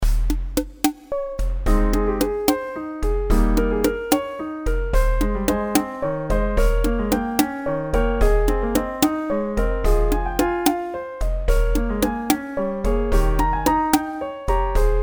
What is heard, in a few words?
Practising BACH